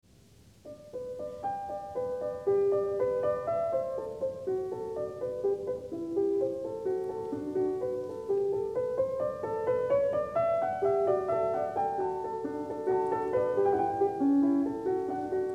Well Tempered Clavier Book II Prelude & Fugue in G; JS. Bach